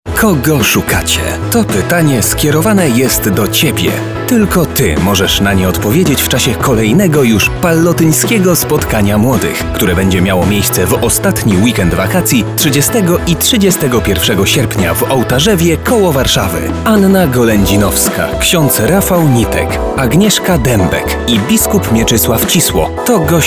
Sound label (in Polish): JINGEL